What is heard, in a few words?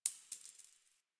Coin Drop